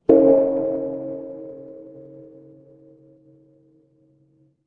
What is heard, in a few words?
instrument gong